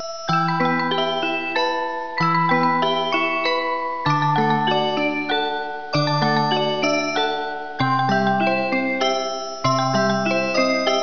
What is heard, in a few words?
Country Holiday Start Windows; desktop themes; x-mas